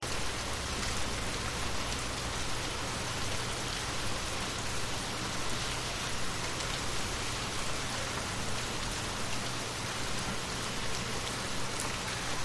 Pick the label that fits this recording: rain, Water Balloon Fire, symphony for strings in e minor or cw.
rain